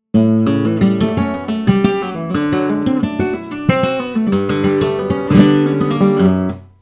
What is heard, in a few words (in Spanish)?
falseta